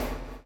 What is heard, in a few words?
CHAIN; Spectrasonics - Backbeat